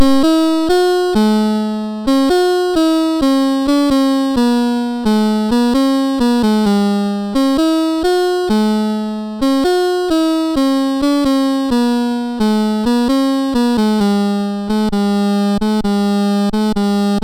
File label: melody, sid MOS6581R3